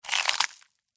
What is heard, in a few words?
Insect Eat Chew, Bite, Crunch, Bug; Animal; Insects Eating; BlastWave